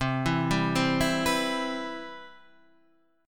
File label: C Major 7th, Guitar Standard; CM7 arpeggiated; JGuitar